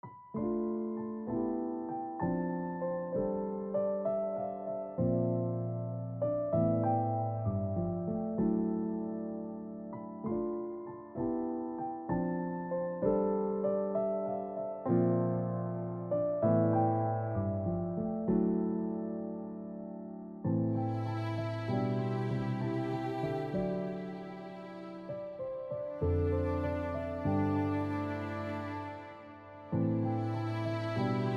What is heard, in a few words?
Dijurido no vocals